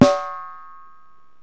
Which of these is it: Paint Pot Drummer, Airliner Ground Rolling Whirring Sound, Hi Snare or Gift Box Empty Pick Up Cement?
Hi Snare